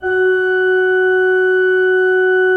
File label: F3, Cathedral Organ; ILIO; Propeller Islands Cathedral Organ